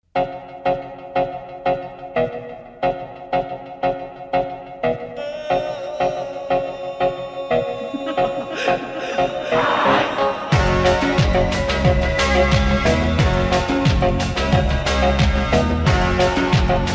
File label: remix slowed reverb